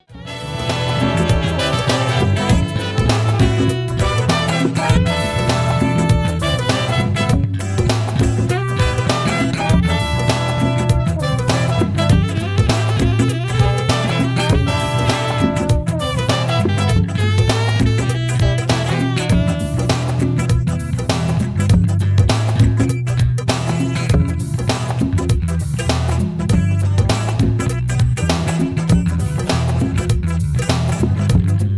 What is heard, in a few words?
c funk